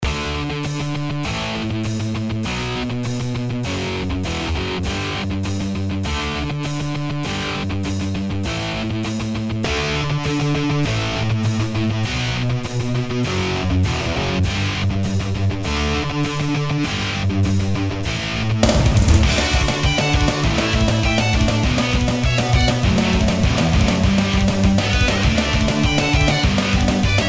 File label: canon rock dark arrangement